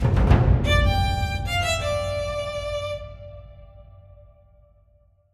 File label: Wolf Clan Sting; Armello The Bandit Clan